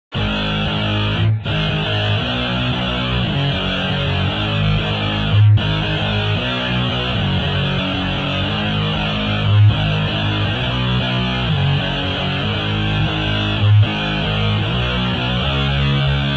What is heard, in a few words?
electric guitar, shifting